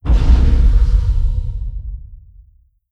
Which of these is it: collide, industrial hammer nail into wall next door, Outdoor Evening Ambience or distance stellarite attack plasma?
distance stellarite attack plasma